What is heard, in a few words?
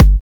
kick